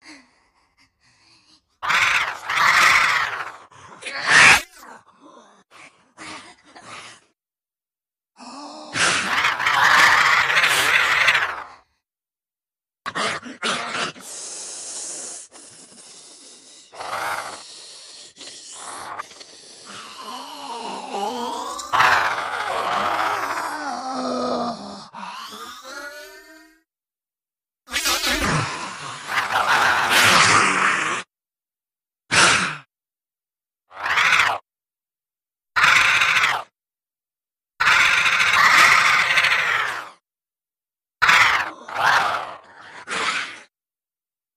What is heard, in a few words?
Kyma Zombie, monsters